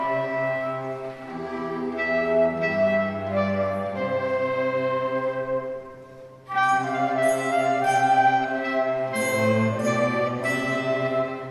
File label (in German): Junges Ensemble